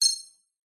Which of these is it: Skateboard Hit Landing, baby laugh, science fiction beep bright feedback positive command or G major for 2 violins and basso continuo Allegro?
science fiction beep bright feedback positive command